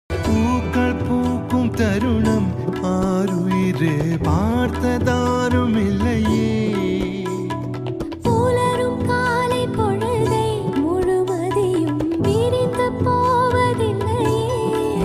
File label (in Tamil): tamil love songs